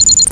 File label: com beep